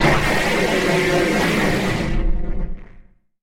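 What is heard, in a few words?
endings outro, disappearing fading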